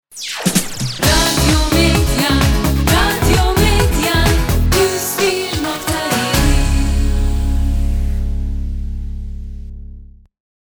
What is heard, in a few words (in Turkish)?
RADYO MEDYA POP